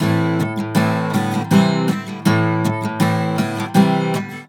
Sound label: AM GTR, Celtic Flavours